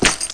player swamp

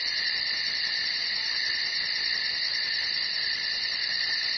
crickets, ambience

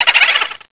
boo laugh simple

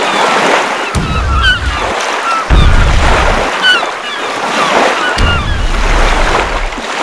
seashore, ambience

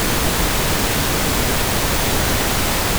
waterfall

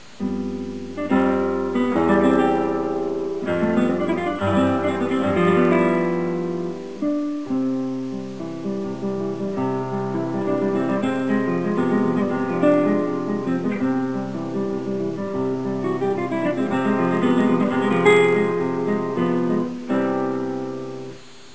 guitar

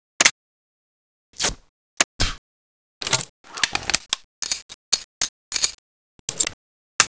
reload, brownbess, weapons

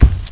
player fabric

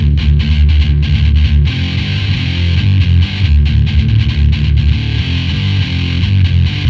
music, ambience

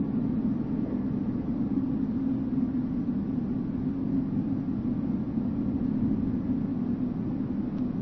wind tunnel, ambience

